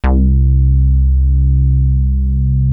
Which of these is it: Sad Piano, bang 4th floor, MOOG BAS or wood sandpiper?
MOOG BAS